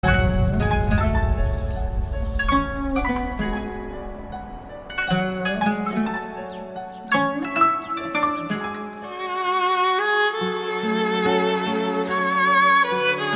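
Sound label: gentle violin - tenderness; nezhnaya skripka nezhnost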